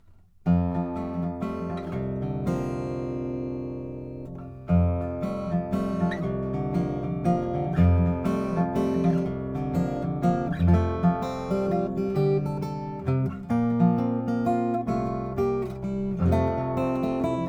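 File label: rainsong jm4000n2 black ice, guitars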